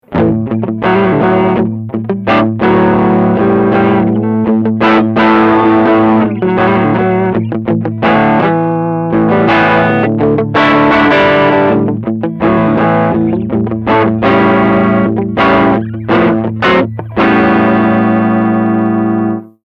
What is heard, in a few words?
Low Gain